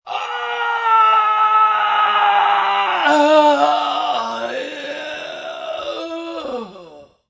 Pain Fading Moan, Pain, male, long yell, falling, distant, agony, moan; orgasm; sex; VoiceBox